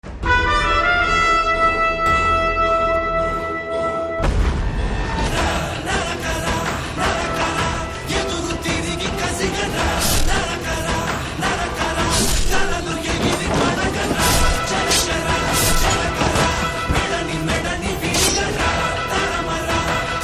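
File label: background music Telugu Ringtone; Narappa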